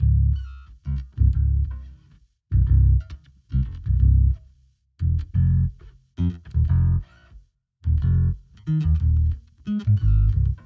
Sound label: 90 BPM G# Maj Bass; Dreamland